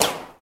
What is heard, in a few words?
Ricochet; this is the police pc